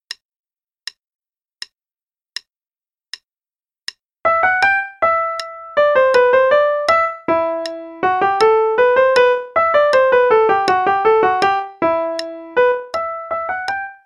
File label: Hornpipe (R.H., qn=80); Play-along; Purcell